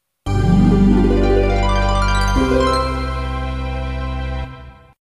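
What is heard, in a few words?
fanfare; Monster girl quest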